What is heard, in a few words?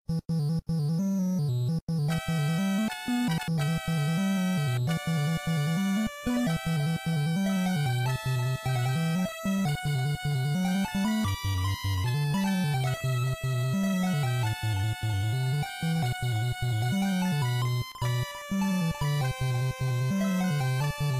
theme 8-bit